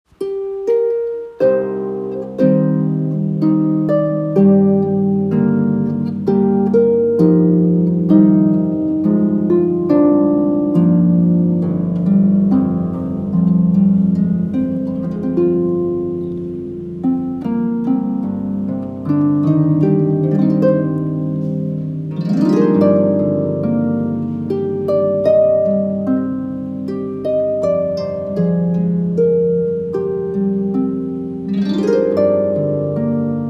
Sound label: harp